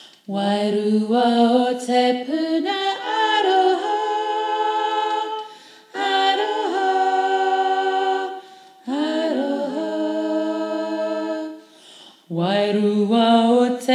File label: bass 8ve up; Whitby Songs